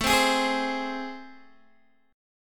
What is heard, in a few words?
A Minor Major 7th, down, Guitar, DADGAD